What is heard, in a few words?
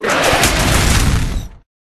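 Rat buff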